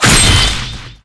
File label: ONSLAUGHT KNIFE ATT; smashing